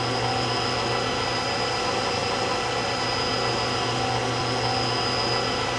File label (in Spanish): SILBIDO TURBO; AOS F1 2022